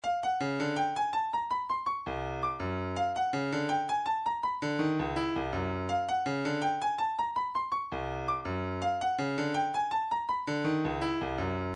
Percussion Bongos, midi; Stylus

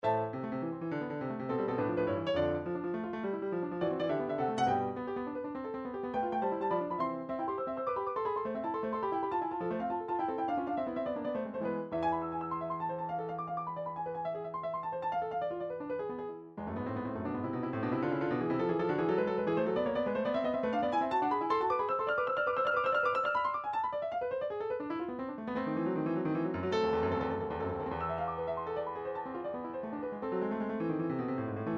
studies for piano, midi

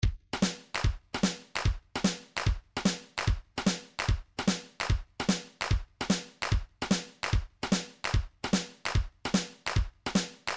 shaker, midi

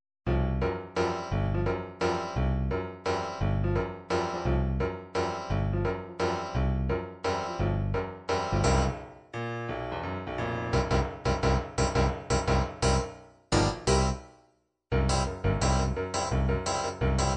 latin vals mariachi, midi

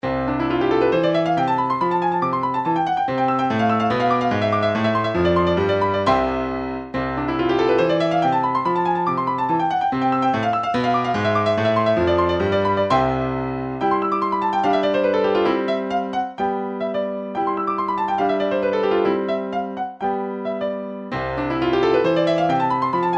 twinkle, midi; song book

